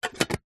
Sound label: tin small empty metal lid on close